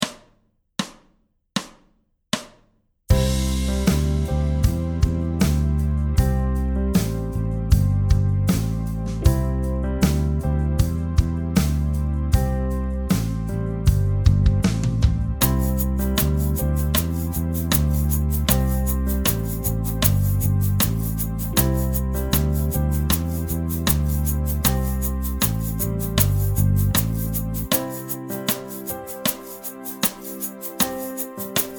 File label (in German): C Dur Pentatonik 78bpm